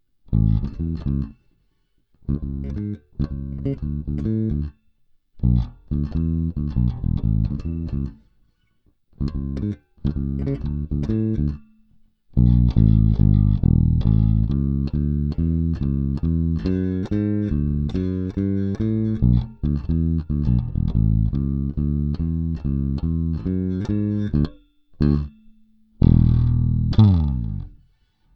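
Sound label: 750G - Asat 97, bass; La bella; Rotosound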